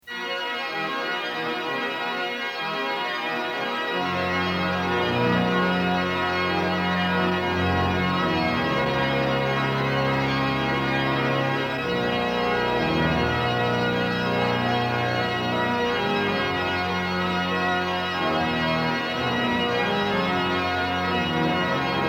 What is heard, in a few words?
French Organ Music prelude in B; dupre